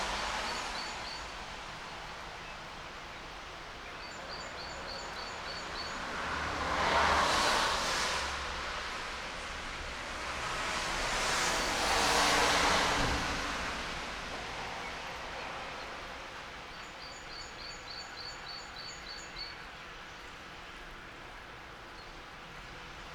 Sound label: birds; givoletto